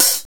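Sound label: closed loose, hats cymbals; Zero-G; Funky Elements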